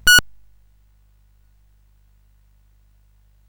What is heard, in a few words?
sid CSG8580R5; Rescue on Fractalus